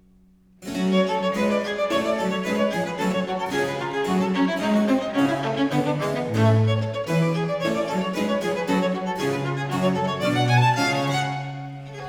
Ayres for the Violin, Suite in G Minor, Passaggio rotto; Book 2; Italian Baroque Violin Sonatas; Nicola Matteis; Ayres for the Violin, Vol.1; 尼古拉斯 · 麦克吉根